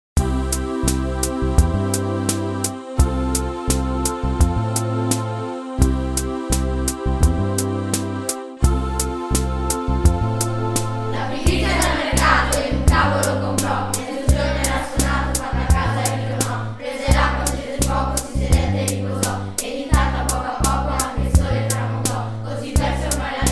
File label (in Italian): senza trombe